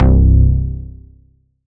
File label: bass hit C; 808s